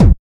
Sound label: original kick sound E key; kick drum samples